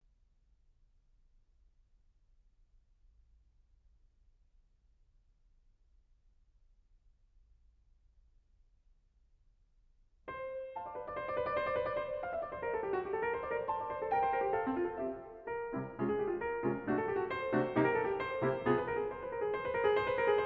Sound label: Sonatina in F Major, Allegro Vivace